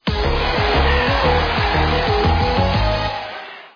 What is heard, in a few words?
UI end; football; Brazil